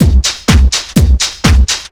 drum loop 125, fatdrive, vocal house; PS-28 Vocal House Samples; drumloops

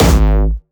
Jumpstyle Kick G#1; vengeance essential club sounds-3; VEC3 Bassdrums

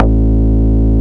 Bass Root A

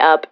Vocal Oneshots; Vengeance Samples; Vocals

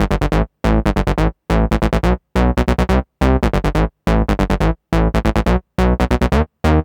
Special Sounds Root A 140 BPM; vengeance essential club sounds-3

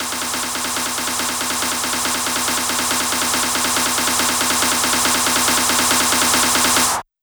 140BPM SNARE 4 Bars; Vengeance Samples; Snares&FX Pairs

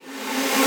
Sweeps Short

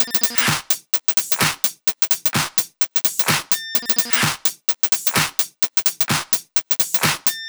128BPM Kit; Vengeance Samples; VFH1 128BPM Pills Kit

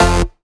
Synth Shot; Vengeance Samples

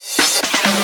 Fill 140 BPM

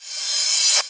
Cymbals Reverse; vengeance essential club sounds-3

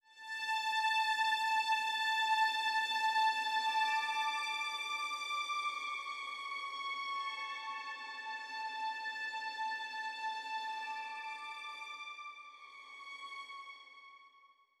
137BPM Strings Outro, Amajor; Unlooped

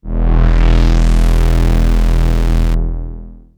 Roland D E1; Roland S100; musicradar-synth-samples

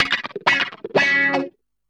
125 c#, guitar licks; house; Vocal House; PS-28 Vocal House Samples; instrumentloops

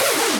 Synths C#; Vengeance Samples

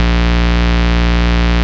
Root A#, Ultimate Bass; Vengeance Samples

